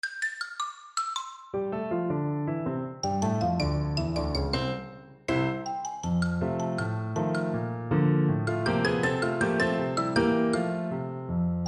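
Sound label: xylophone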